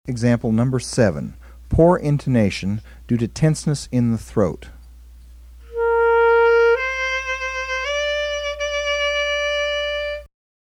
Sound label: Emb SAX; Embou Sure